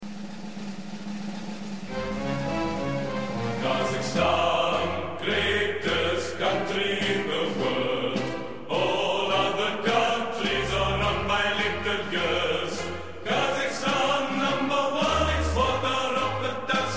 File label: kazakhstan national anthem